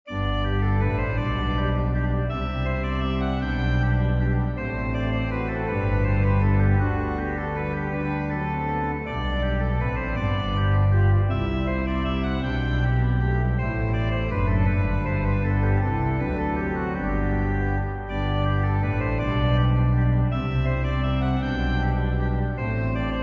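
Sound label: Menuet G Major; Bach; Christian Petzold; Pianoteq and Organteq; Modartt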